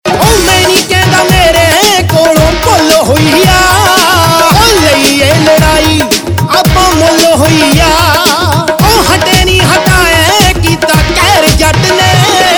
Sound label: Ringtone, Punjabi